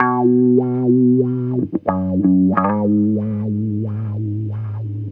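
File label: guitar, Roots Funk; USB Soundscan vol.41; AKAI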